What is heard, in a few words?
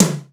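TOM, drums; East West; Global Sounds; SPD11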